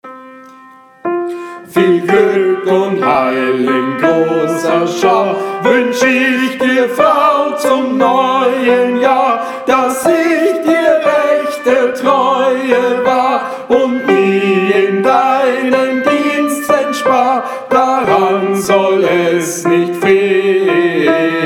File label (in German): TENOR; Gesang; Viel glueck und viel Heil; Liedgut